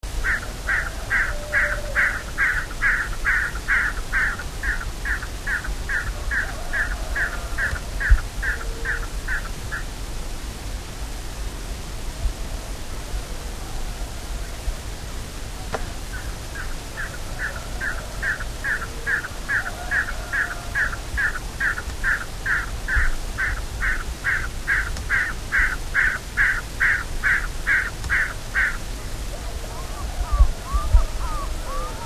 Gallinago undulata; Ground song and one flight song; recorded Aguara-Ñu, Mbaracayú Biosphere Reserve, Departamento Canindeyú; Juan Mazar Barnett May 1997

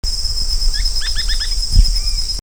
Synallaxis spixi

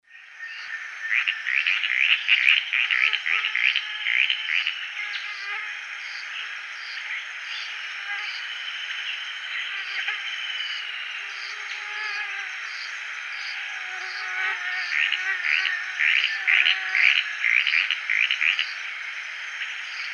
Tityra cayana; Ocampos

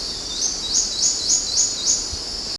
Hylophilus poicilotis

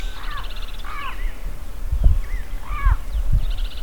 Amazona aestiva

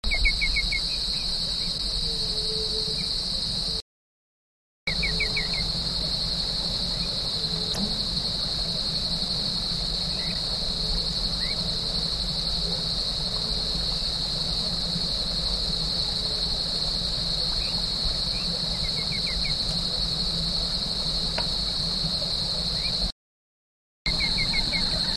Laterallus xenopterus

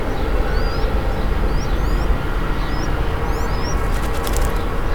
Zenaida auriculata begging fledg

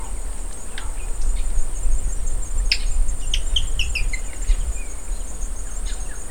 hemitriccus margaritaceiventer

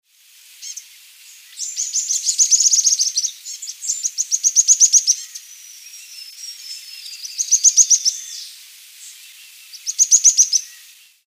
Xenops rutilans; Streaked Xenops; Song; recorded Reserva Limoy, Departamento Alto Paraná; Myriam Velázquez September 2000

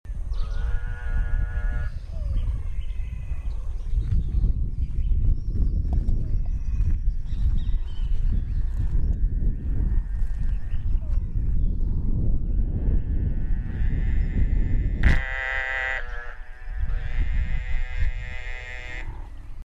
Dermatonotus muelleri

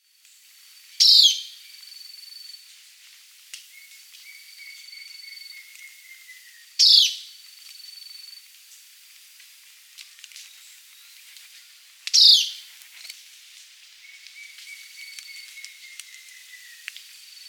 Philydor atricapillum short alarm

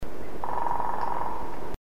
dryocopus galeatus drum

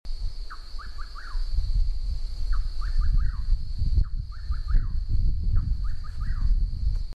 Caprimulgus rufus

